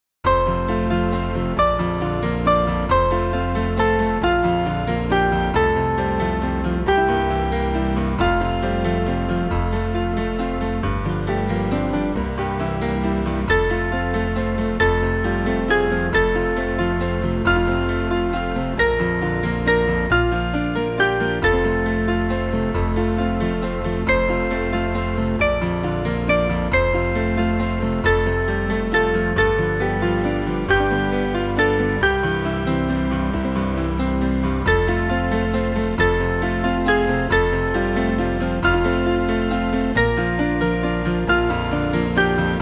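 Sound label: Music Backing Files for Churches; Upham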